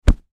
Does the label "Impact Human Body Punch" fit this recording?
Yes